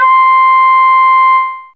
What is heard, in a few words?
Flt C5, Wind; Fairlight CMI IIe